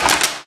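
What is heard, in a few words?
physics clipboard impact small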